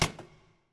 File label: quick Stop; netent; reelrush